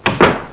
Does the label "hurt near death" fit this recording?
No